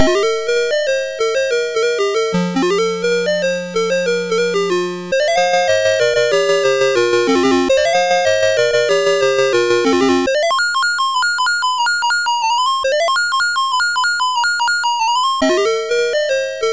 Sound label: sid MOS6581R3; Hopto